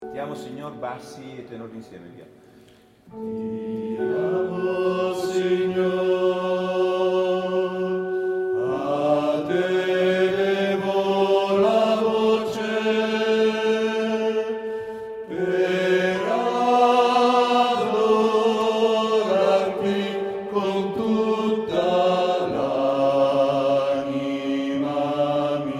Ti amo Signor Bas Ten Voc; Visita Arcivescovo

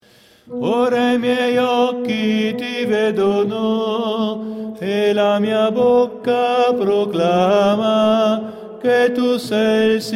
Bassi; 212 Ora i miei occhi ti vedono

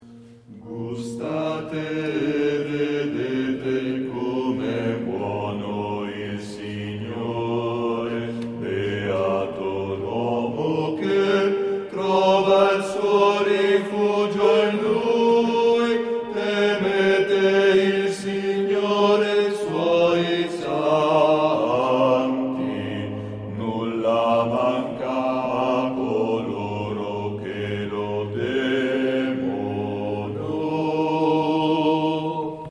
Gustate e vedete, Coro; Visita Arcivescovo

